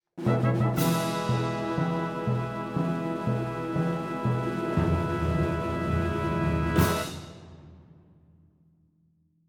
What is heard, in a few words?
Ta Daa Fanfare